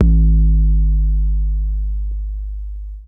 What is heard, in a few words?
Bass Drum Sub Sine; Lost Tapes From Mars